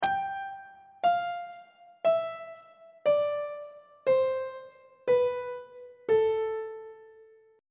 g double sharp minor scale descending on treble clef